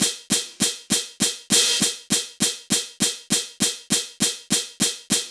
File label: HAT LOOP, Laser Tag; E-MU Formula 4000 Series Vol. 1; Hip Hop Nation; Laser Tag 100